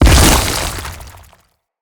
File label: Infested Bone Blade Death Impact; Enemies